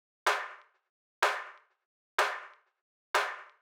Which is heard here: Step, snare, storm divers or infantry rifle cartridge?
snare